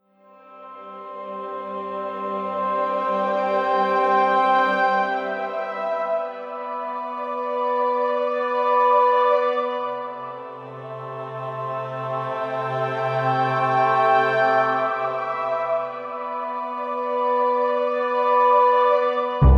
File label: Ork Loop; Noisebiscuits